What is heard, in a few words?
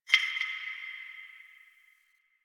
Interior Distant Marble Hit; Warframe; Ambience; Nineteen Ninety Nine